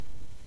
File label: axe chop; Character Actions